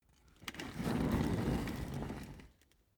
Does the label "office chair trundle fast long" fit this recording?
Yes